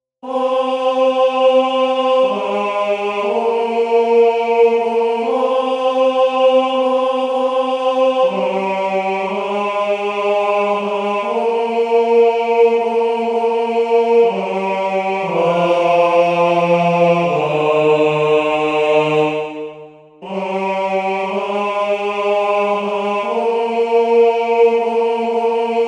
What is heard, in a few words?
O FELIX ANIMA hommes; THELEM